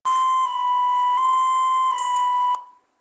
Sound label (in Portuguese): frances; 27C10 NOBREAK; sirene